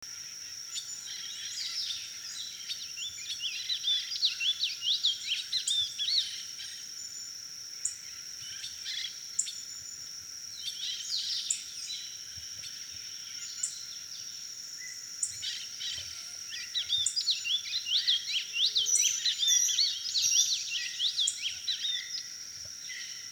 Wing barred seedeater

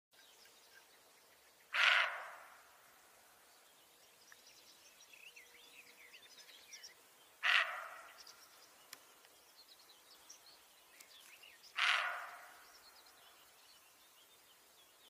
Red brocket

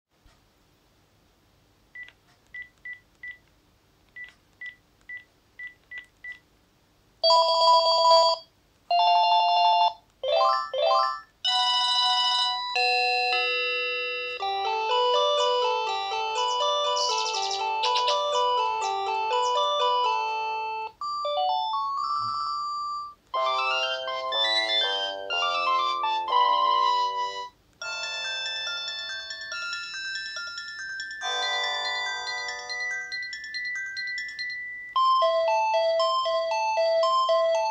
Old Panasonic Phone KX-TG2511RU

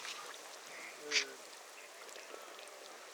Swinhoe's snipe; صدای پرنده پاشلک چینی

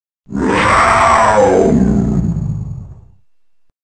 Thylacine

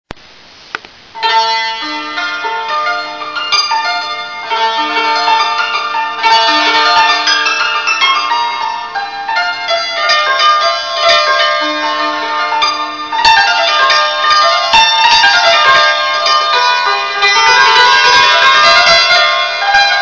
Santur, سنتور

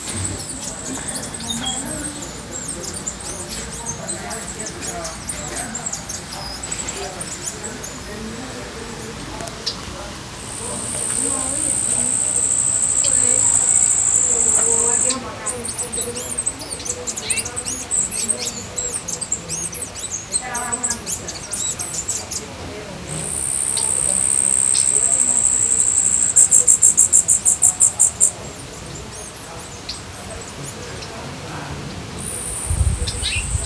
Bee hummingbird; مرغ مگس زنبوری

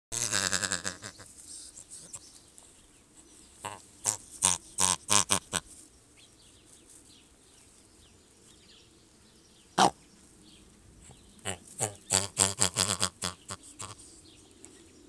Great bustard

